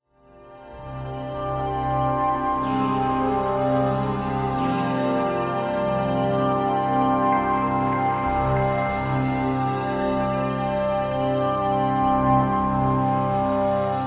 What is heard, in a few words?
AMBIENT EXPERIMENTAL